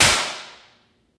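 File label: physics clipboard impact large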